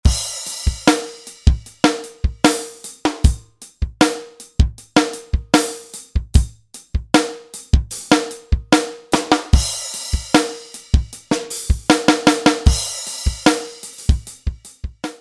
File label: Rhythm Stix, Roland SRV-3030; Preset